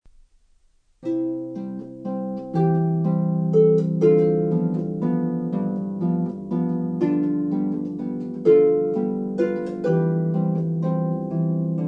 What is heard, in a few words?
Skaters Waltz, harp